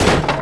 Vehicle Quadbike Side Impact; Playable Tutorial Level; TR3

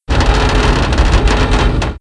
Metal Crowbar Door Open; Playable Tutorial Level

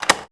Use Object Metal; Lara; Playable Tutorial Level

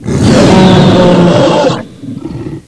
T-Rex Roar; Playable Tutorial Level; TR1

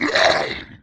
Lizard Man Attack; Playable Tutorial Level; TR3

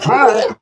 Boss Take Hit; Puna; Playable Tutorial Level; TR3

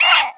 Vulture Attack; Playable Tutorial Level; TR3

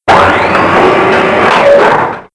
Lift Move; Playable Tutorial Level

